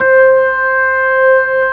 ORG B3, organs; Sound & Vision; Gigapack I CD 2

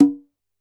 Conga H open, Standard Kit, Yamaha PSR-E323

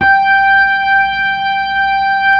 B-3, Keyboards

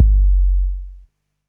SNGL.BASS, Jungle Rave

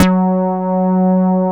MOOG PRO, BASSES; Masterbits - Vintage Voltage; VV BASSES

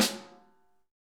SN, Real Mega Drums

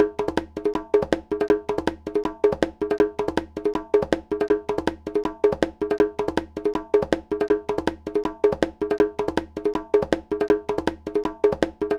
Conga, Latin Percussion

